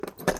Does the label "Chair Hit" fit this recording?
Yes